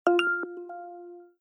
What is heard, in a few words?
auto accept dispatch accepted